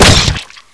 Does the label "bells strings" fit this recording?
No